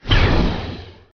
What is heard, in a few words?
Portal Out